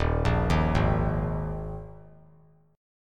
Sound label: D sharp Suspended 4th; D#sus4 arpeggiated; JGuitar